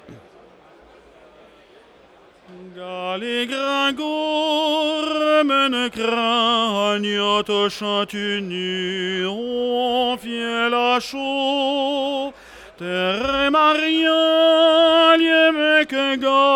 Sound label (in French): Chant